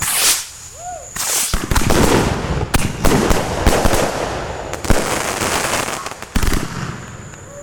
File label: Fireworks 4th Of July